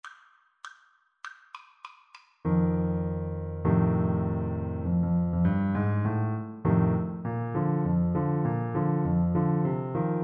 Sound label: ASAX